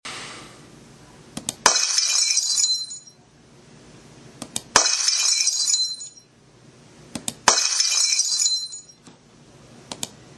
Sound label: Break Glass